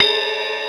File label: Casio SA-10 Ride; 300 Drum Machines